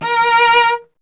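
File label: strings cello A#; Miroslav Vitous; Solo Instruments VOL-1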